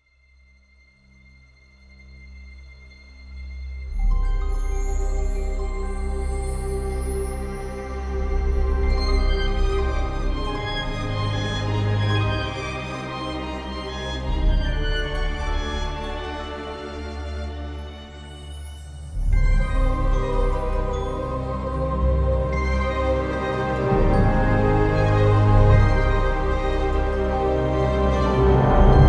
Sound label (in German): mit Violine; Magic Forrest